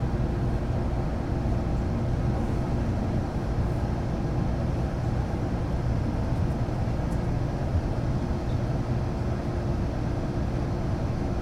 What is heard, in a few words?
Shinkansen stopped